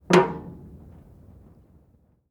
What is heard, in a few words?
metal hatch